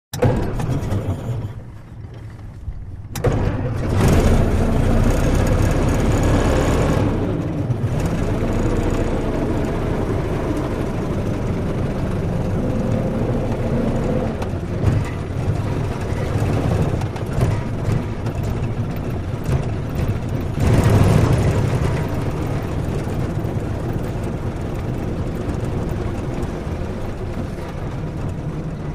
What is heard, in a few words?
Model T; Start, Variable Slow Steady. Suspension Rattles Over Bumps And At Slow Speed. On Board Perspective. Off At End.; Ford Model T